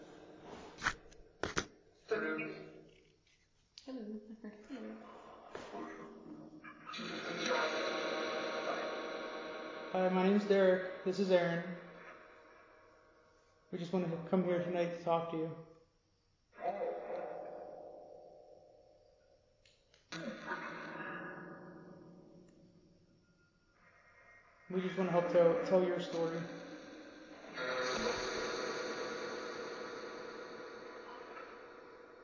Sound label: Ballroom Phasma Box